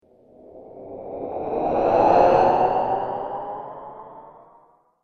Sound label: Superhero Transition